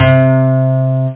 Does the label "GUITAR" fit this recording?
Yes